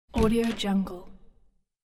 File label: metallic door open; domestic sounds